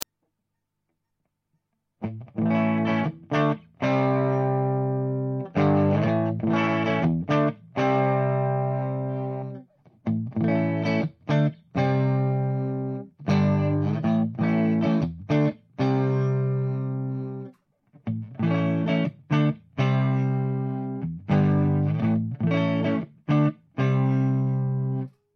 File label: usa tele clean; orange tubefactor; tele pu test